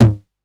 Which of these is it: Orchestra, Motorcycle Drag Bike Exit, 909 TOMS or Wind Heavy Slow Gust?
909 TOMS